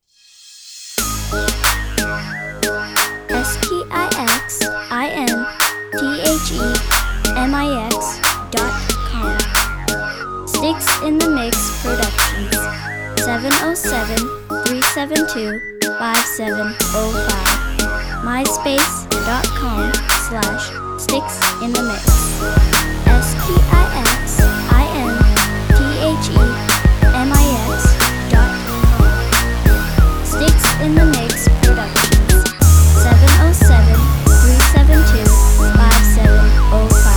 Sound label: robot whaa